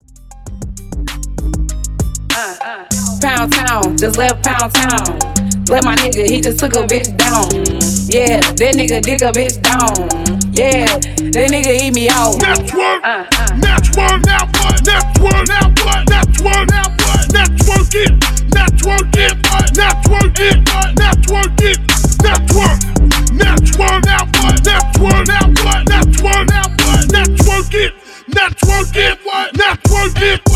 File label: Twerk It 98 BPM